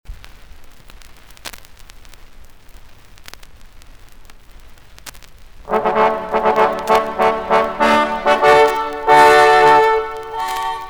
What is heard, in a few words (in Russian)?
Увертюра; Советская эстрада 50-60